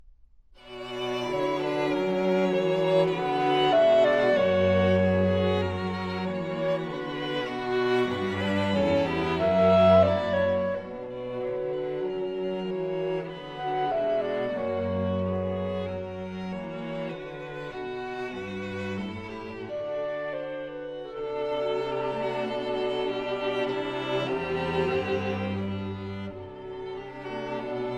Quintet in B Minor for Clarinet and Strings - Mvt. 4. Theme and Variations; Brahms Trio & Quintet